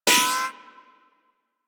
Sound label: Bullet Impact Ricochet; Warframe; Weapons